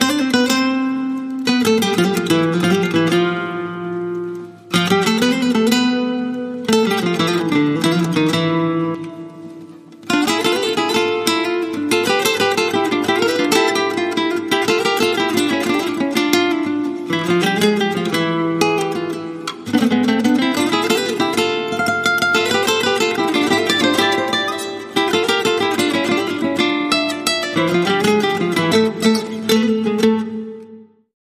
Guitar Only

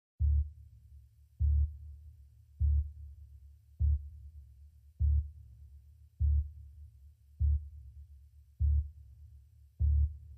No Lead Guitar

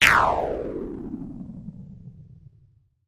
Hollow Gritty Zap

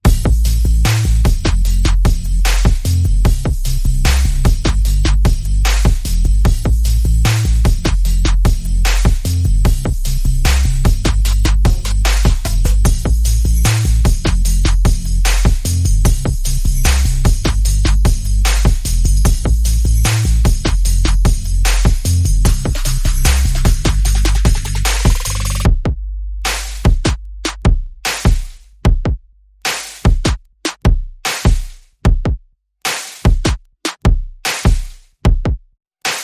Bass and Perc

